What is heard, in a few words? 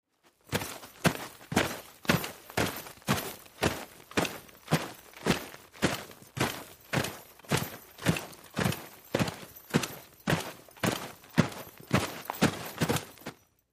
Footsteps Group Sol